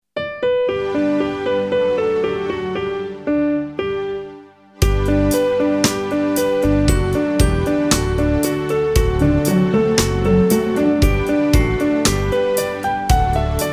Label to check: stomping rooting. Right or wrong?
Wrong